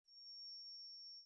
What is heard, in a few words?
trumpet